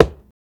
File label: foot stone, footsteps; midnight guns